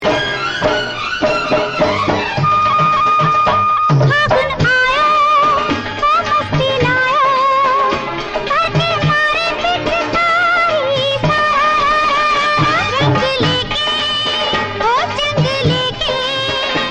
holi song

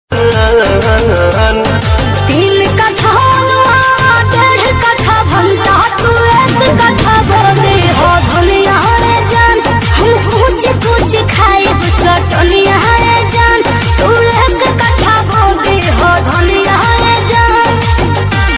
Bhojpuri Song

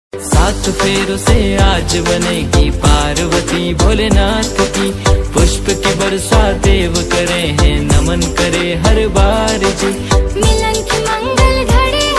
Maha Shivratri New Song

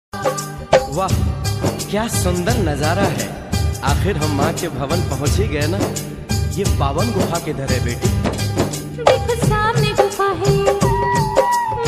song

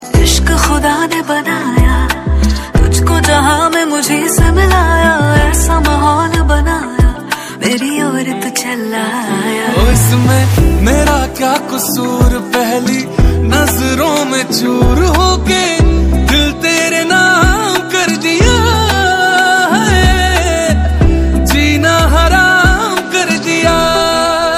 Female Version Song

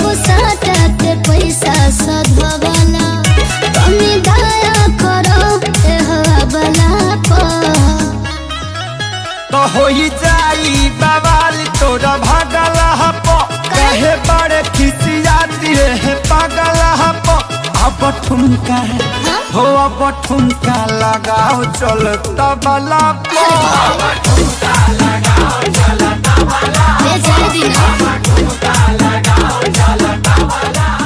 Tabla

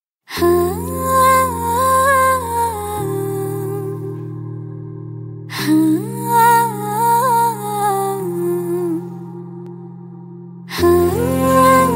Female Version

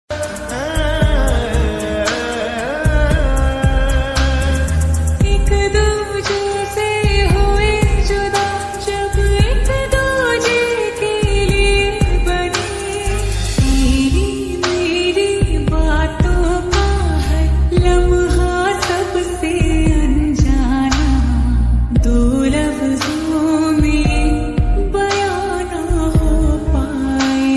Female Version; bodyguard

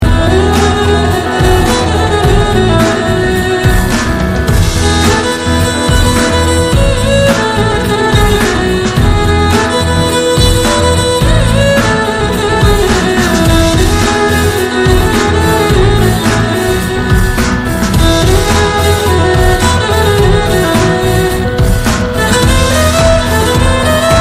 Flute Music; Animal Movie; Ranbir Kapoor

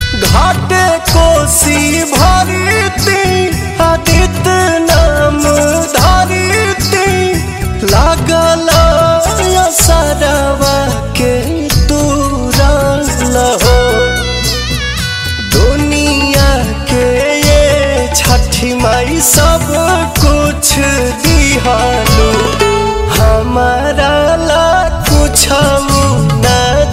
Chhath Puja

